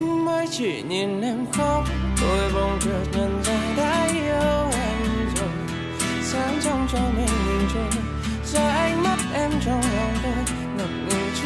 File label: acoustic